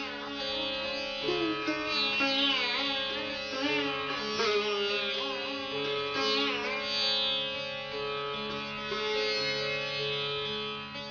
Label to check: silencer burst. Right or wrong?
Wrong